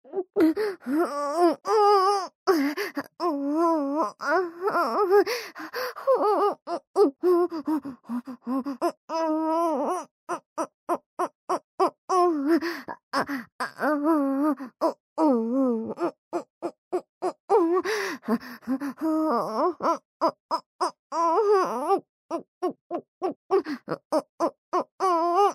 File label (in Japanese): オホ喘ぎ声ループ SE無; オナサポ